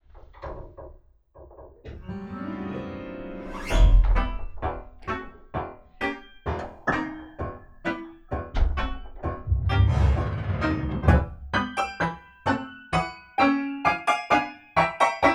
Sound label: Strings Picked Sus Mute and FX, fazioli concert grand